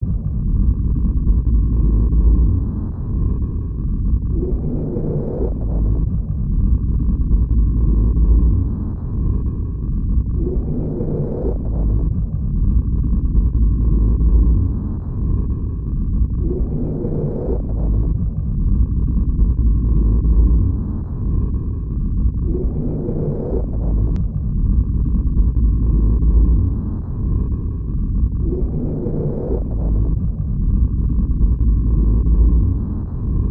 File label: ambient noise